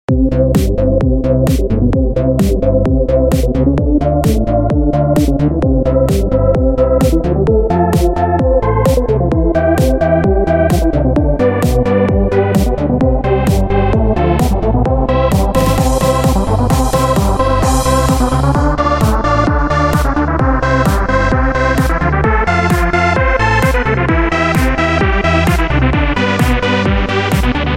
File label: trance remix